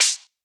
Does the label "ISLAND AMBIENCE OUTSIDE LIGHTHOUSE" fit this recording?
No